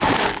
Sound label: Sand footstep